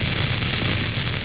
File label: frying, kitchen